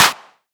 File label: hand clap sample E key, Royality free clap one shot tuned to the E note. Loudest frequency: 3183Hz; single hits one shots